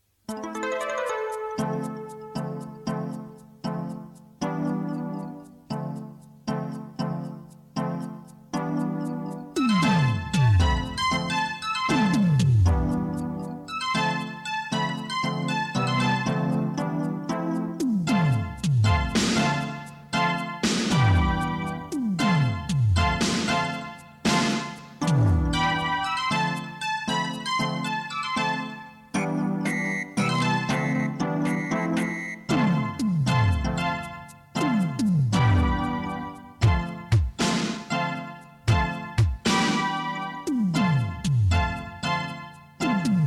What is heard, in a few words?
Piano End; Intro 2 Song